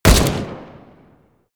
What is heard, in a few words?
rifle close shot; comedy-cartoon; pistolen-gewehre